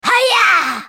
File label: attack; Kennen; Character Voices - English